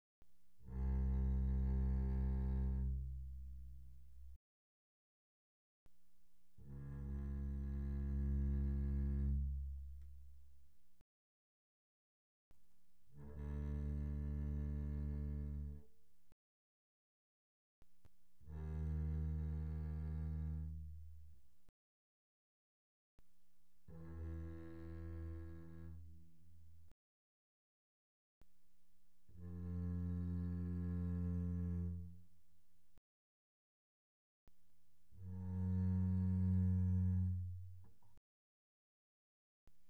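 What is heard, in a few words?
Bass arco pianissimo sul A C2 B2, doublebass; Strings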